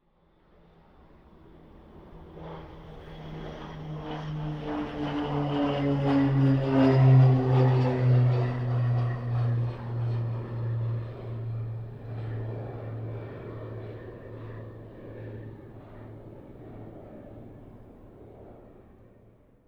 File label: small plane